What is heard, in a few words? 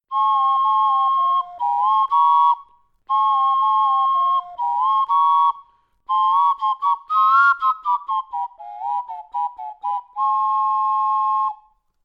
Flower Duet; Die Sihan reduziert die Funktion einer Flöte auf ihr Wesentliches – ihren Klangerzeuger